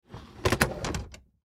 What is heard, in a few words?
Computer Desk; Computer Desk Keyboard Stand Pull Out; Typing; Office; Blastwave